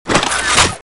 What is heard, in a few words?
item get